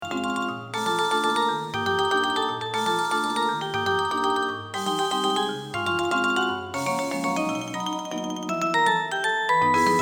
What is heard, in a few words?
magic carousel, marimba